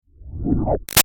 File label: Up Liquid Digitalness; Sound Design; Stops